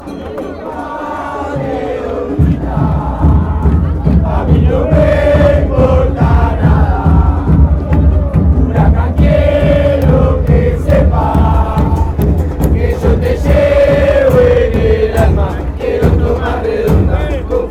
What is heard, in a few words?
chant, argentina; huracan